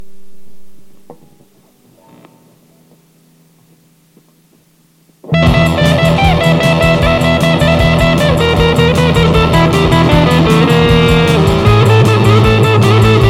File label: Rock N' Roll Badass